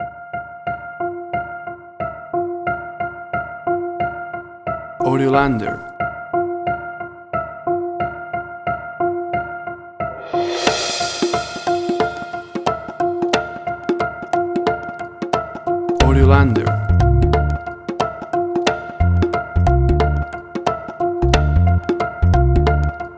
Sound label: Brownie